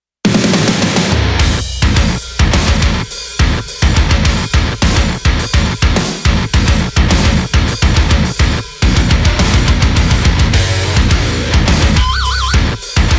chop metal machine

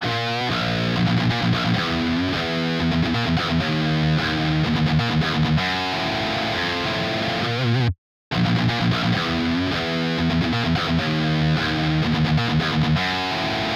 tone test

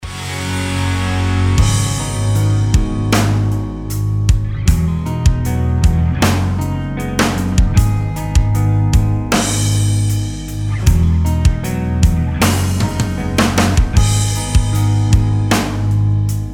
rock

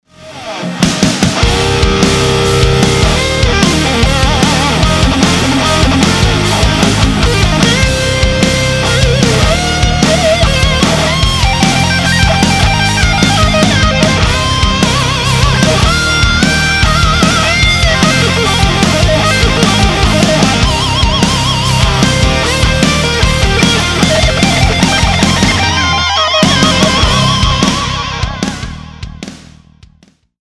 gtr solo

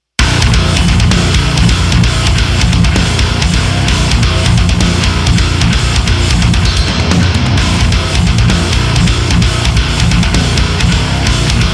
metal, tones

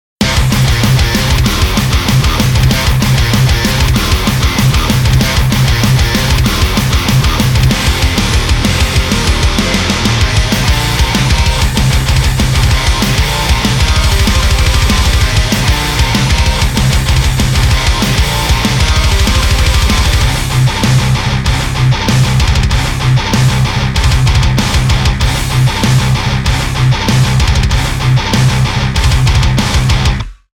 metal

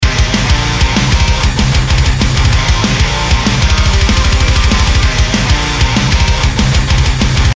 our impulse flavor

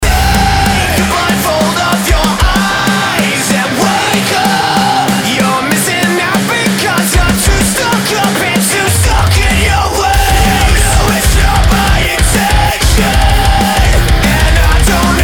drum fix

